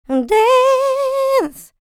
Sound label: LICKS, Vocal R&B Dance; LT DANCE